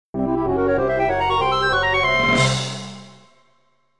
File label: World Door Open; Banjo-Kazooie